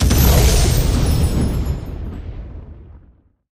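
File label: big win change text; wild water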